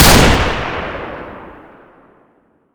mr96 regular, weapons; cw mr96

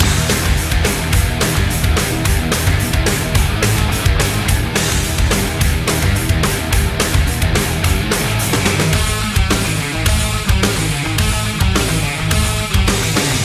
music loop; actmod; fortnite; emote; plasticfork

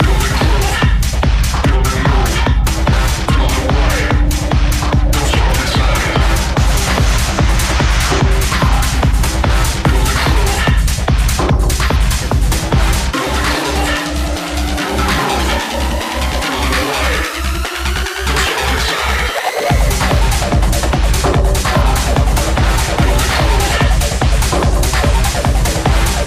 shiverflame loop; actmod; fortnite

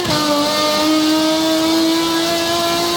third, vehicles cars; ren alpine zar; lwcars

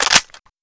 mag in, weapons; cw l85a2

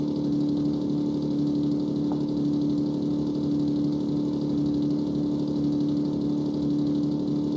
idle, vehicles cars; ren alpine zar; lwcars

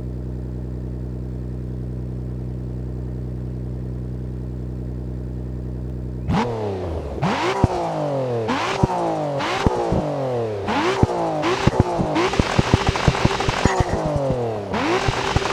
idle, vehicles cars; lwcars; niss skyline r32

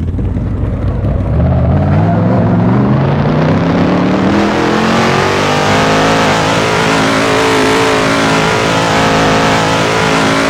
rev, vehicles cars; ford falcon xb; lwcars

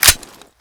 slide release, weapons; cw fiveseven

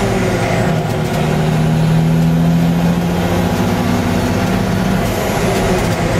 third, vehicles cars; lwcars; kamaz

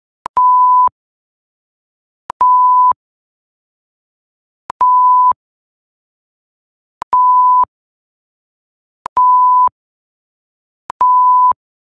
bomb bip; fire system

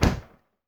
freezer close; blues pharm

